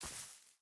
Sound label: footstep hide grass walk; Rust